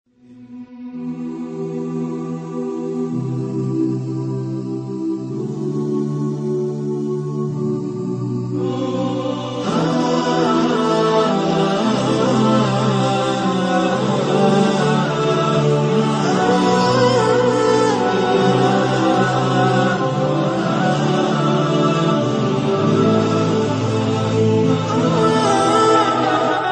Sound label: emotional islamic ringtone